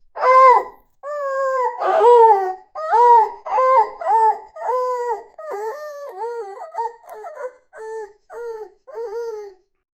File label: little dog sobbing and cr